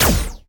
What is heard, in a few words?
Sp Gatling Shot; Se Sp Gatling Shot